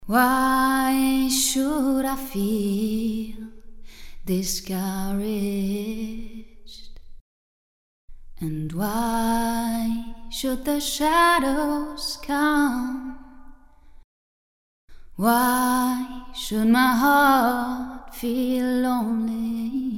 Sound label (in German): Gesang